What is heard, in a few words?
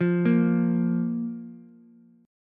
A sharp 5th A sharp Ukulele; arpeggiated; JGuitar